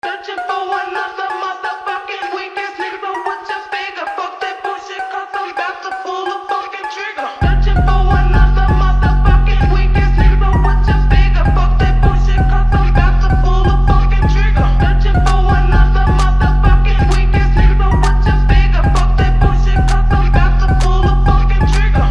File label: Phonk